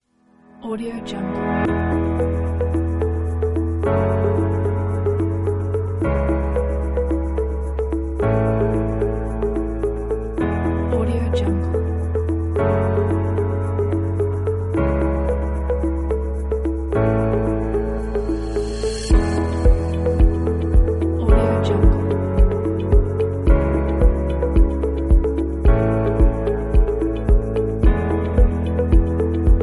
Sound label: calming ambient